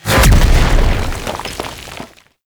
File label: Jump Heavy Land; Quests; The New War; Archon; Amar